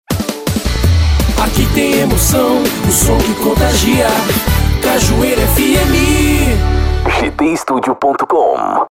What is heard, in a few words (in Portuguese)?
vinheta cantada; cajueiro fm